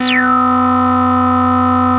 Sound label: Synthesizer Middle C Notes, Lead